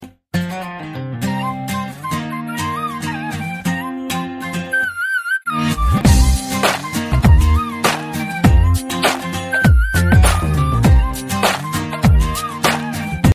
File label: flute sound